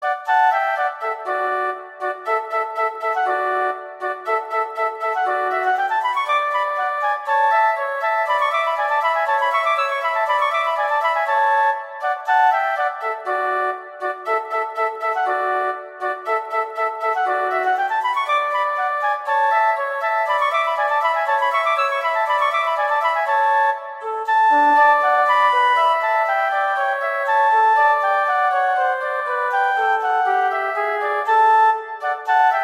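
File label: Duet, horn; Mozart; Duets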